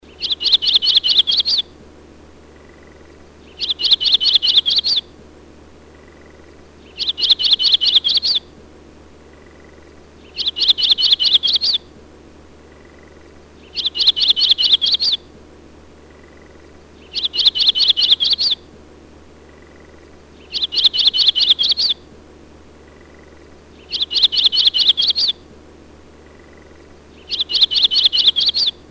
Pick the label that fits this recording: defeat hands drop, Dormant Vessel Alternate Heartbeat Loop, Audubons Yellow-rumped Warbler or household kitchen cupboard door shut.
Audubons Yellow-rumped Warbler